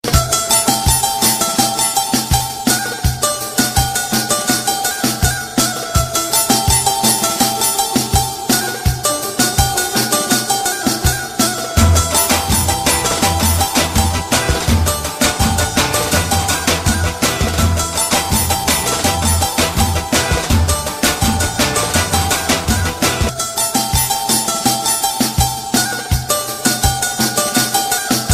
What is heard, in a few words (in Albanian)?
Melodi Shqip